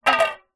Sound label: tin small empty no lid down on hard ground; foley; zapsplat